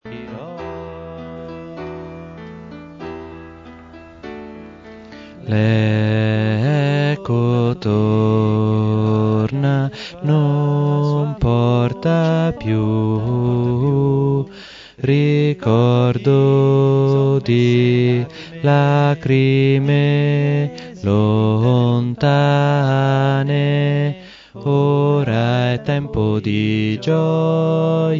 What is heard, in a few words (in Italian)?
contralti; ora e tempo di gioia